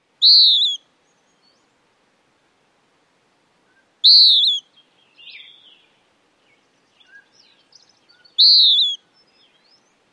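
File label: western wood-pewee calls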